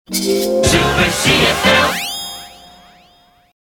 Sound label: Brrring; NewsBump